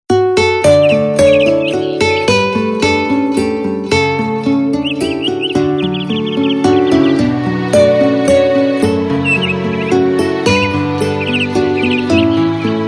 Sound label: Song of Birds; KTF EV-W550; Sweet Morning